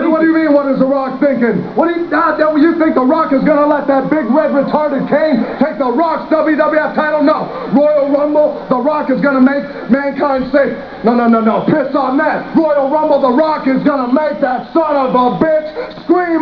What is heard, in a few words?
rock